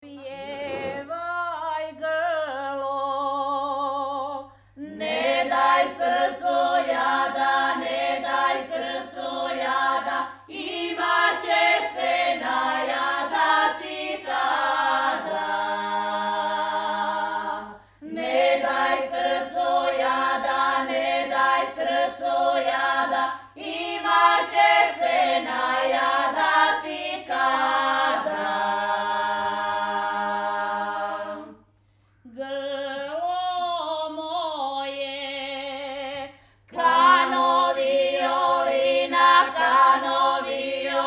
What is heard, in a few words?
chant serbian women